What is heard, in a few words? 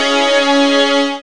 synth glide, dance synth; Sound & Vision - Gigapack I CD 2 (Roland)